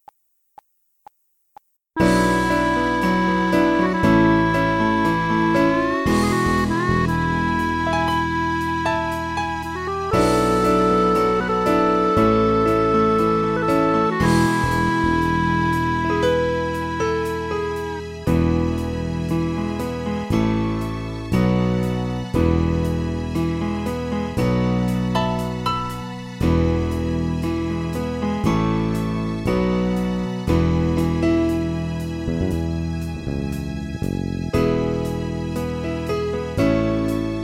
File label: Second violin E; Bagatelle